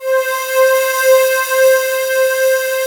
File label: pan pad C5; PANY PAD